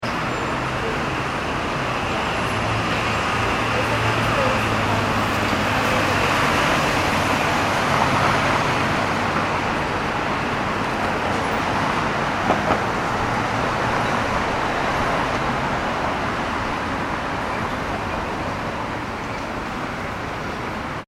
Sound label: Nyc Street Ambience (East 42Nd Street); Backgrounds; New York City Street Ambience ( East 42nd Street , Near 2nd Avenue ) - Traffic And Pedestr; Parades